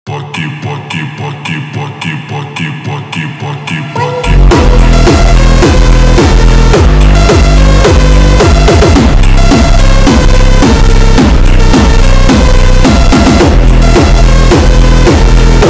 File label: hardstyle remix